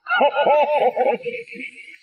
risa papa noel

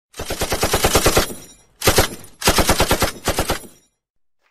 ametralladora; alvarof

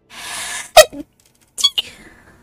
estornudo cute

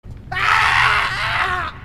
grito; chewbacca falso

